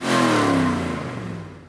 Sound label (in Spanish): v8 decelera